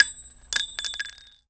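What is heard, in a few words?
shell bounce small, weapons, shells; ModernWarfare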